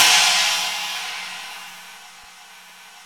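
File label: CHINA, china cymbal; Roland LA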